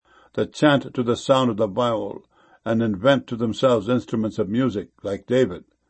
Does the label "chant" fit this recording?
Yes